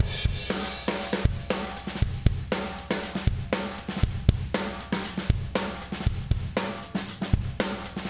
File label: Compressed Drums